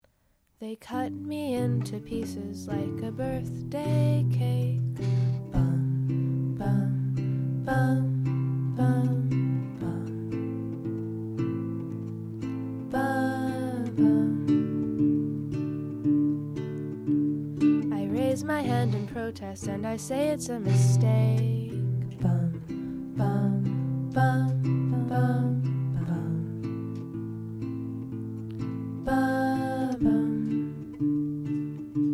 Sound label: Banjo